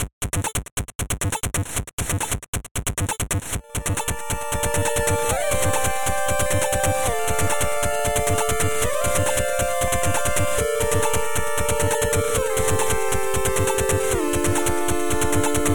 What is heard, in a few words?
chiptunes